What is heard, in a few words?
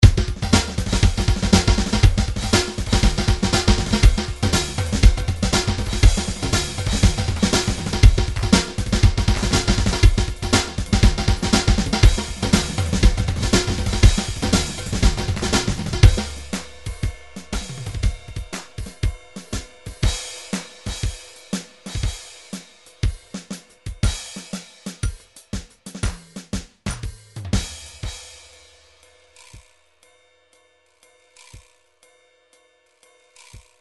drum grooves midi loops swing; Steinberg Nice Beats 1000